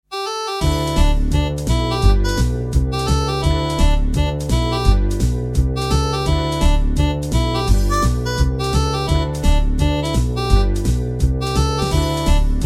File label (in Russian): Garmoshka Vesiolaya